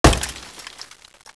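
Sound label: windshield, automoveis batidas; windshld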